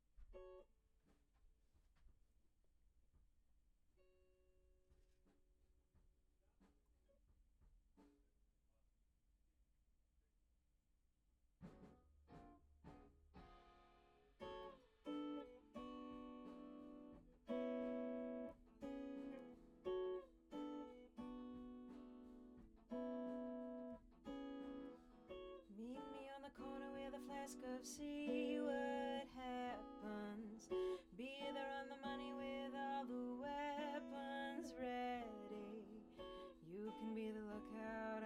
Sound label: Act Guit R